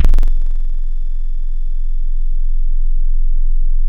Metalic Zipper; Metallic; Synthetic's Serum Collection; Echo Sound Works Core Tables

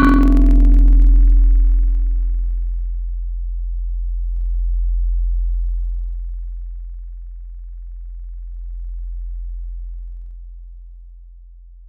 Marimba; Synthetic's Serum Collection; Echo Sound Works Sphere

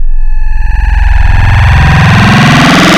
FM Squealer; Wavetables; Synthetic's Serum Collection; ESW Core Wavetables; Echo Sound Works Core Tables

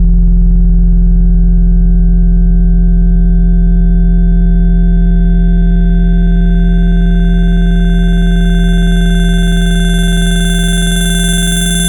metallic vocal bell; Synthetic's Serum Collection; Echo Sound Works Core Tables

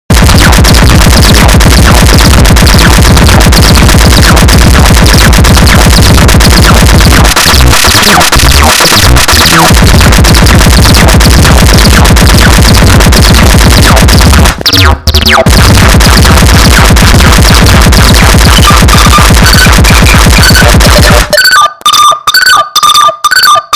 splitteracid

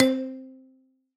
MALLET Dream Balafon; Synthetic's Serum Collection

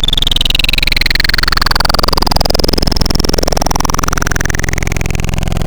Clicky; Synthetic's Serum Collection; Cymatics - Nuclear for Xfer Serum Wavetables

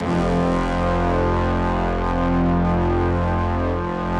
low sustain; Echo Sound Works Grey V.1

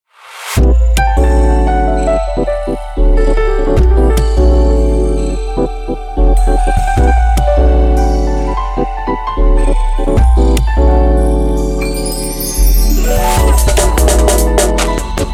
Ring'n'bass

